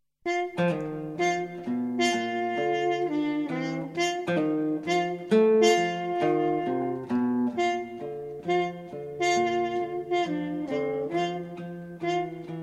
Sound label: Blues trio